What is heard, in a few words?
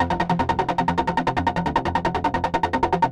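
fast, fast sequence; Music Store; Yamaha VP-1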